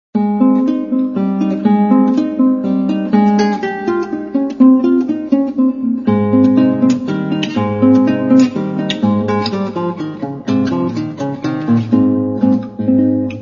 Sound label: sonata tempo de minueto